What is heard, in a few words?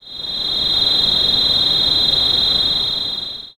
night insects